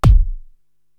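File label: BD, bass drum, drum machine; Korg TR-Rack; Raven 99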